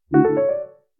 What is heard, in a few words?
Pica, notifications